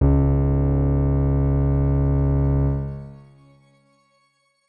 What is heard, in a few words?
Grain C#1 pp; healing soundscapes; HSS Ableton Pack1; X Grain